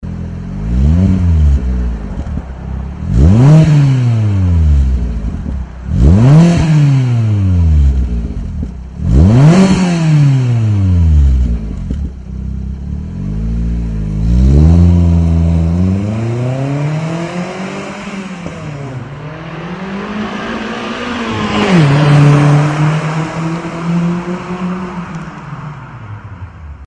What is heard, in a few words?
magnaflow exhaust systems